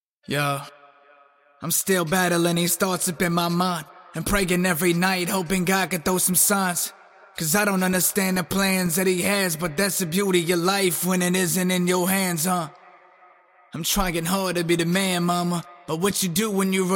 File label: hip hop 84 Gm; acapella